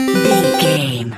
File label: collect item chime